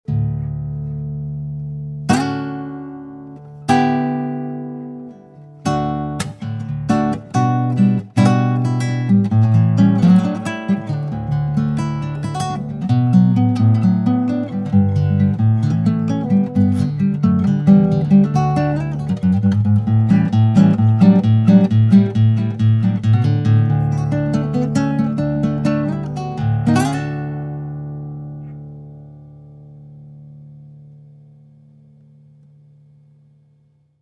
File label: Guitar